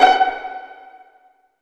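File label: F#4 pizzicato, strings; AKAI S-Series CD-ROM Sound Library VOL-8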